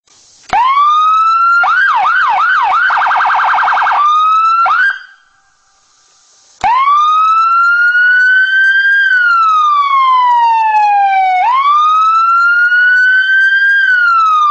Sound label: Ambulance